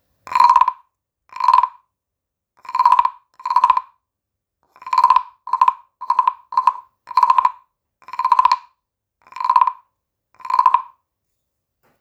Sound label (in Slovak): zabavelka; hracie zvieratko stredna zaba